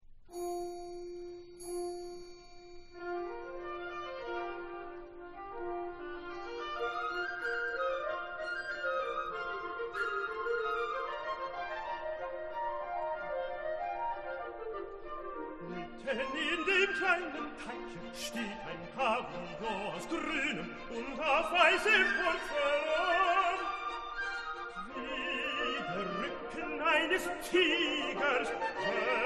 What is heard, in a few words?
tenor; mahler